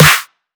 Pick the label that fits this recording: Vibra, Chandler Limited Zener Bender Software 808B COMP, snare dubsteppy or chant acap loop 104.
snare dubsteppy